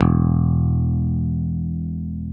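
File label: SB PICK E0, SPECTOR BASS; Northstar - New Gold VOL-1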